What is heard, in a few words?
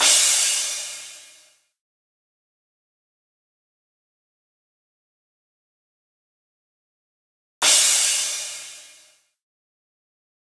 Crashes, Future House 126 BPM F; Big EDM; Future House Tools; Construction Kits; Let The Beat Go